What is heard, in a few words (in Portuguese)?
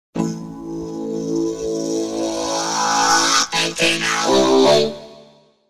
Vinheta; Antena 1 SP